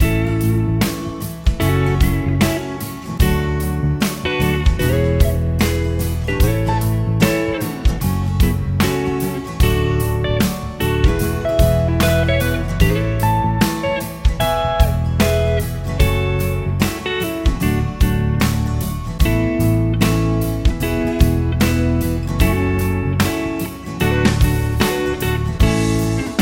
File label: Confident Pop Rock 155